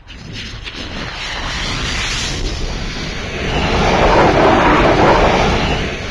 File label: whoosh huge, near miss; Source Engine; video game; physics